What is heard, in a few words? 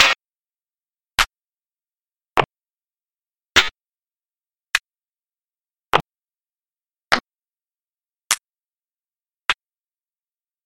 clap short; STOLTINGMEDIA